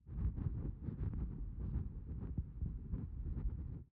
Arbre Contact Fourmi Homme, Friche Industrielle Rhinau

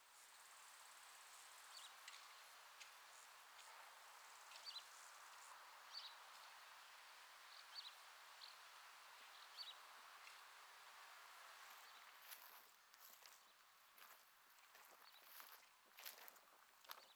Friche Industrielle Pas Gravier Et Grillon; Rhinau

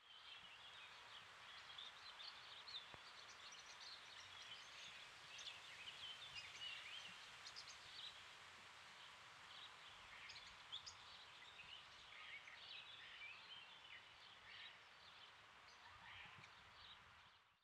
Arbre Lierre Oiseau Pépillement, Friche Industrielle Rhinau

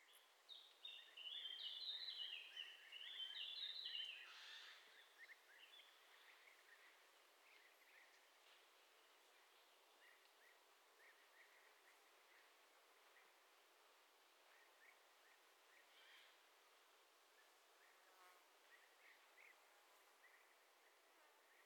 Très Ancienne Carrière Lac Friche Bord Forêt Oiseaux Grenouilles

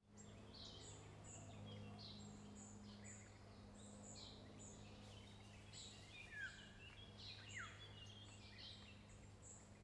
Avion Oiseaux Et Herbes, Très Ancienne Carrière

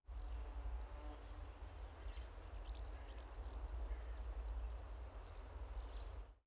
Basse Voiture Et Pépillement Et Abeille, Friche Industrielle Rhinau

